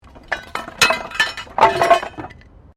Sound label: Wood Metal Move